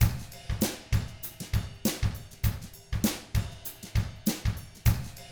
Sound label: FUNKY, Drums